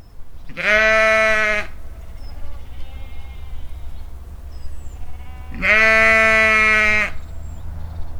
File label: Sheep bleating